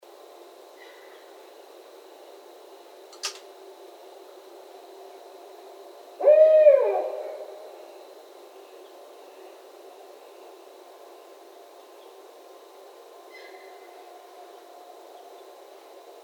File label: Barred Owl